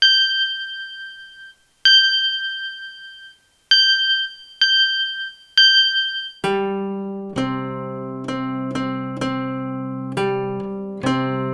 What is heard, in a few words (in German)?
Fanfare, Zither; Playalong; Neue Zitherschule